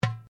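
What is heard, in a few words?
Drum Darabuka Edge piano, Egyptian Darabuka Drum; Commanly available Egyptian Darabuka Drum; Drums; Sound Effects:Musical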